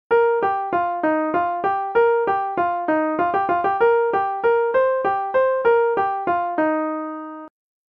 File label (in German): Morgenstimmung, Trompete